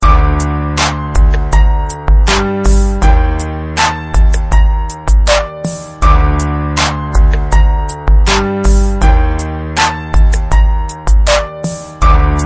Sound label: slow beat; meditat relax